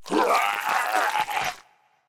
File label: minion death grunt; smite; npc; roman archer